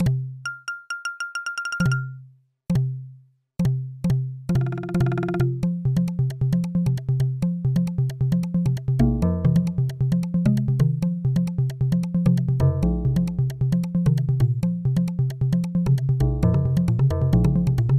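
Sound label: background music; Strider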